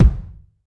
short reverb kick b key, kick drum tuned to the B note. Loudest frequency: 162Hz